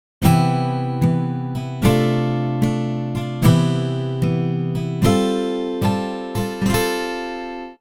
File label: Tutorial (Guitar Beginning)